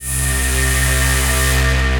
Ominous Pads; The Distorted Truth

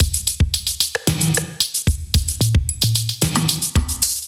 Kit groove 112 bpm; MIDI Clips; Wild 'n' Free Kit groove; Drive and Glow; Ableton; Factory Packs

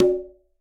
Quinto A Open, Conga; Latin Percussion; Drums; Drum Hits; Ableton; Factory Packs

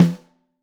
Snare Top

